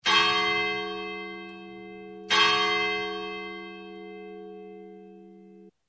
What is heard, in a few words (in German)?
glocke klein halb stundenschlag